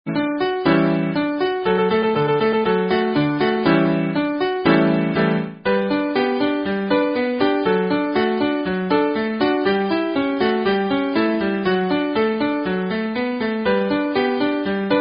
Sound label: Midi